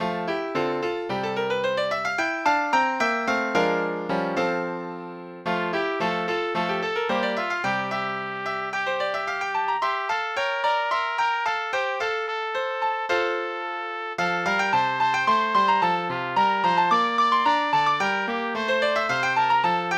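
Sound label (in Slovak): pre trúbku a klavír; Stáli ďievki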